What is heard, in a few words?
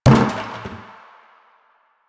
destructible bucket impact